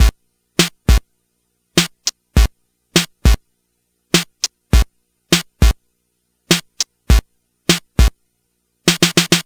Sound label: Game Boy Break Loop, 8-Bit, Drums